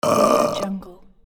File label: burp